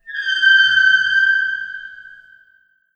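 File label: underground metal; blackforest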